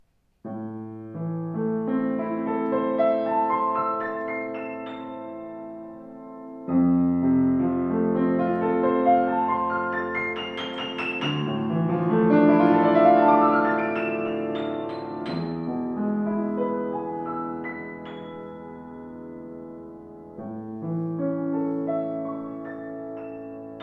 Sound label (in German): Bechstein Kreuz, Klavier; 124 bechstein elegance silent